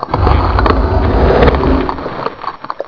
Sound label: bite, lurker, monsters; AoMClassic